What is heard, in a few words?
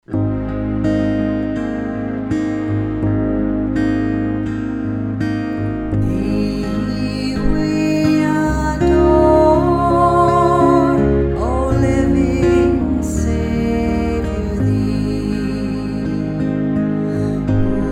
hymn thee we adore